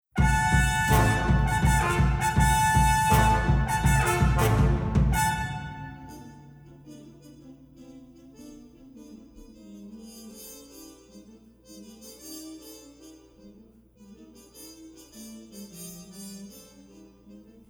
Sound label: Prelude Fugue and Riffs, For the brass